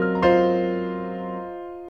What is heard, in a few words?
CHORD R, Drum & Bass 140 BPM; Ueberschall; Drum & Bass Flash Trackz